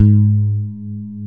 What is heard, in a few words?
G#2, FRANGE BASS; AKAI S-Series CD-ROM Sound Library VOL-7